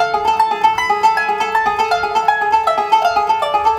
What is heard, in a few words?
World Lick; Sonic Foundry (Sony Creative Software); Ambient Grooves; World Licks